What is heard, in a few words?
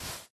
sand, dig; Minecraft